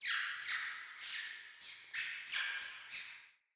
Cave Mine Rat

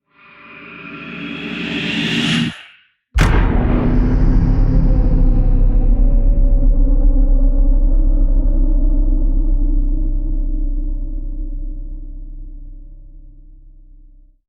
Boom; Warframe; Clan Dojo; Dagath

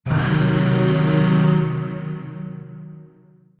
Void Shawzin Blues Chord D; Warframe; Zariman Void Shawzin

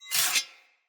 Sphere Sword Whoosh Accent; Weapons; Swords; Sphere Sword And Board

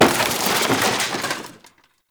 Wood Table Impact; Warframe; Ambience; Nineteen Ninety Nine

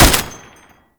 Tank Turret Shot; Enemies; Weapons; Warframe; Lasrian; LasrianTank

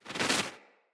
Duck Tail Shake; Foley

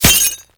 Helmet Impact; Grn Chem Nox

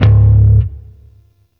Sentient Shawzin Oct One C Sharp; Warframe

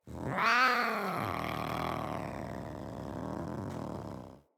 Feral Vocal Idle; Catbrow

